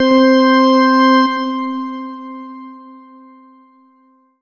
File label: Ensoniq VFX-SD Cathedral Organ C4